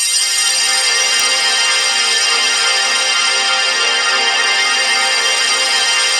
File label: ATM SYNTH; Best Service - XXL Production Effects